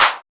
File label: TR-808 Clap; Roland BK-5; Claps & Snaps